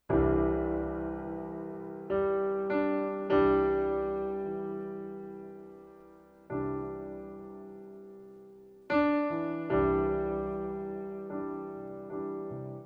Piano Base Loops

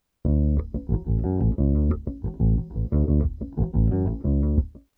Baixo Vaneira Loops

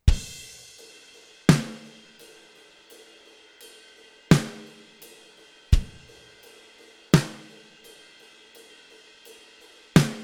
Baterias Gospel

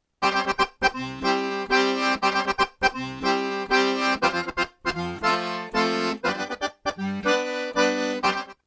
Acordeon Arrocha Simplificado

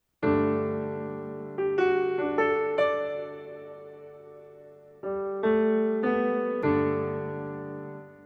Piano Base Loops

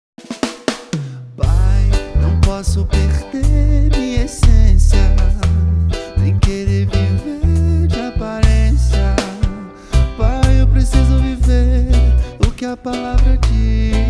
Amostra Bass Reggae

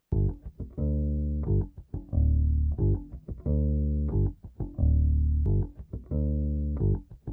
Baixo Vaneira Loops

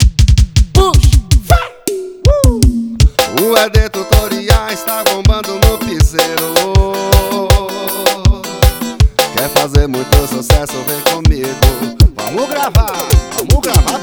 Piseiro

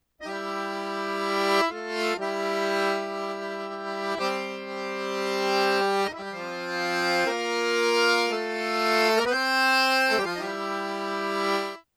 Acordeon Arrocha Simplificado

